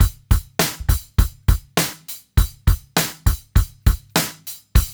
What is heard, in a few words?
DRUMS, Funky Ass Loops; East West